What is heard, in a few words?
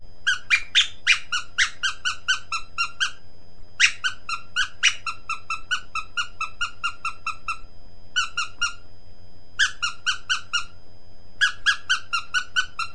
bird squeaker